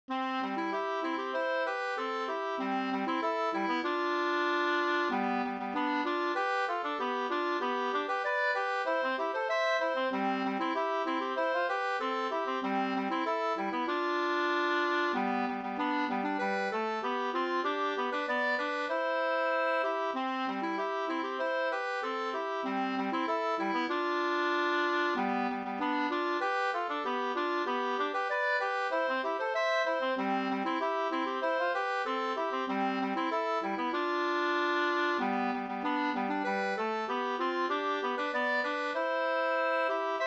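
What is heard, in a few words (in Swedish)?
snoa Cdur, two-part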